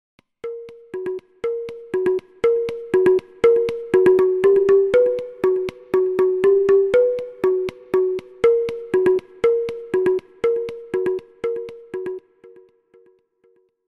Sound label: duggis